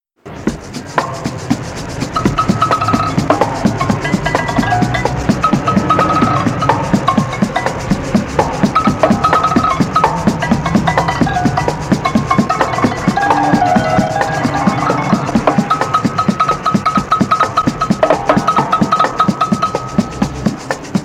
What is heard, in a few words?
Percussion Solo In Traffic